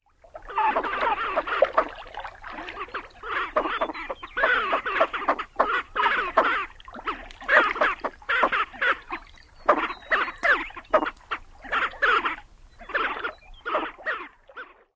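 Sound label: courting males, lesser scaup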